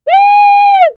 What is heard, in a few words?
congratulations sound